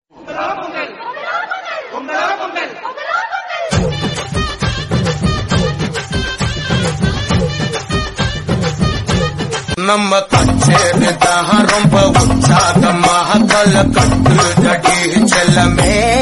PONGAL RINGTONE

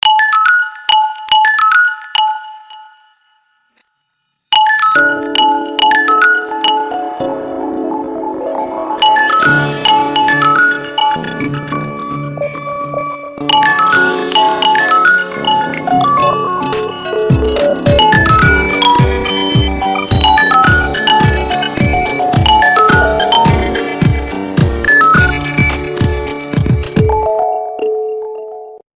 VIVO Y55S RINGTONE

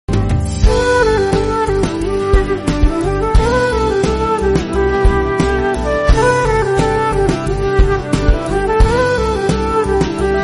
INSTRUMENTAL RINGTONE

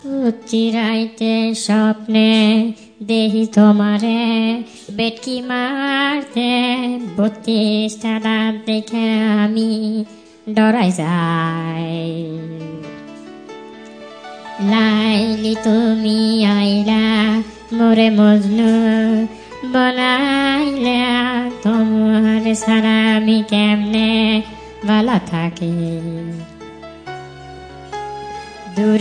FUNNY BENGALI VERSION RINGTONE; TITANIC

